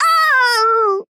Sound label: Ranger Death